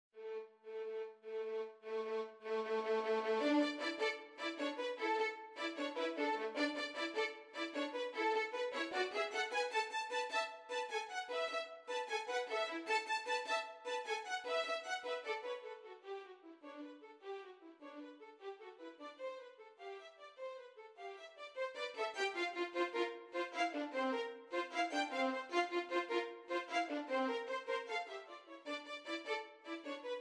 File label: Duo 2 violins